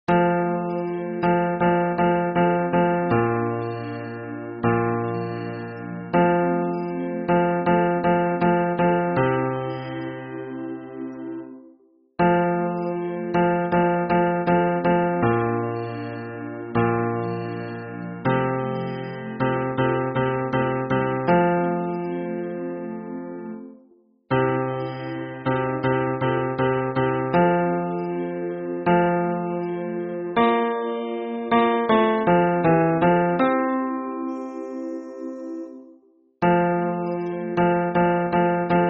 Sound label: hymn bass